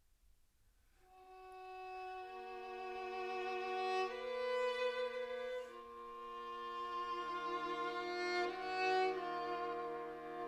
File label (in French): QUATUOR A CORDES